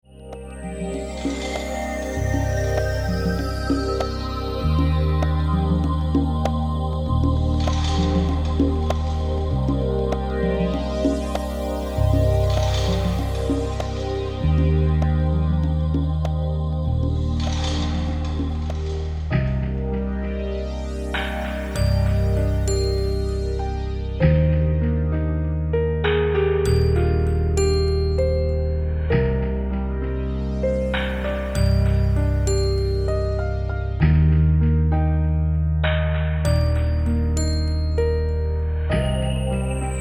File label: Spa Music Relax